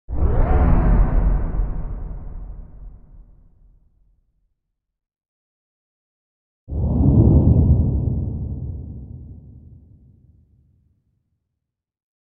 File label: Bass impact - Whoosh x4; Hollywood Edge Sound Designers Tool Kit